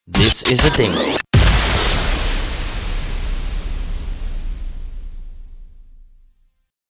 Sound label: Chrash Bream Boom